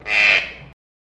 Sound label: Geese Quack Call